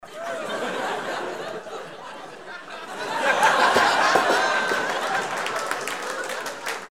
laugh applause

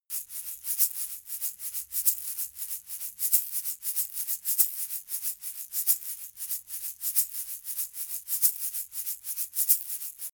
eggshaker slow long

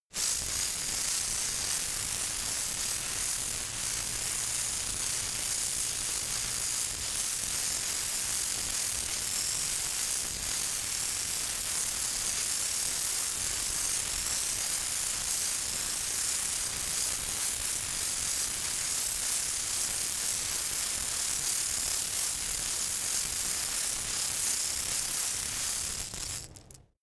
insect shaker constant

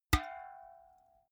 big can empty hit hard

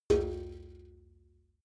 metal bucket hit soft

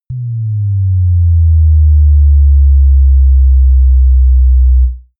bass drop soft dirty

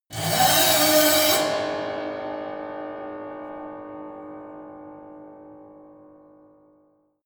piano bow string broken

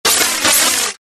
noise burst

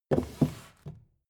book shelve

